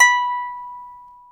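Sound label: harp A#4, Elizabethan harp; InVision Interactive; Lightware VOL-1; Instruments & Percussions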